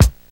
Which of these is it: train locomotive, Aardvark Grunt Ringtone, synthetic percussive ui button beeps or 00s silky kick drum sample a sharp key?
00s silky kick drum sample a sharp key